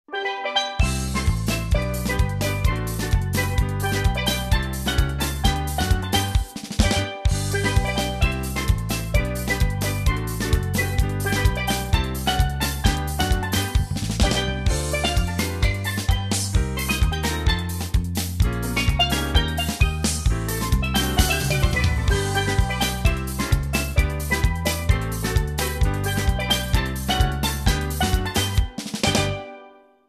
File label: Caribbean Band; Chicago